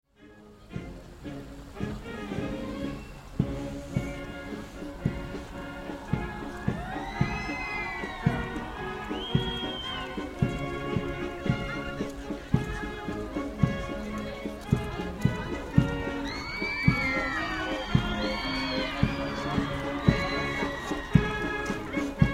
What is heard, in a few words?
marching band in plovdiv